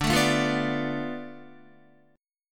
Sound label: D Minor 6th Guitar; Dm6 strummed; JGuitar